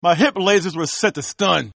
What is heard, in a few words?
win; bebop